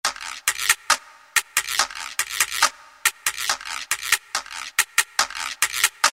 GUIRO, Roland SN-U110-02 Latin And F.X. Percussions